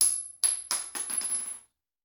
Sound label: Bullet Shell; Sound Ideas; Guns